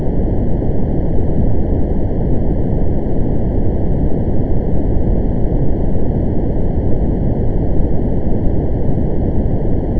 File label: holo noise